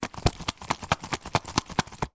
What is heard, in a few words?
wallet purse leather shake; foley